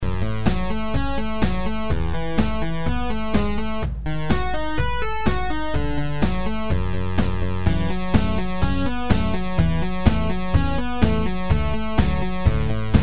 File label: 8bit